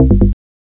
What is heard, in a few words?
fail; GoldSrc